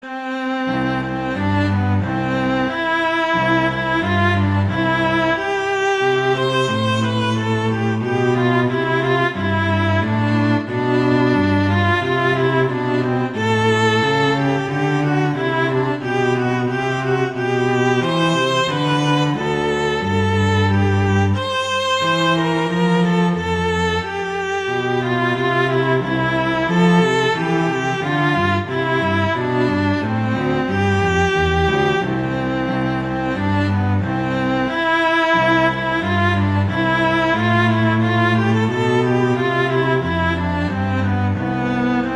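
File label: Duet, horn; Mozart; Duets; Vla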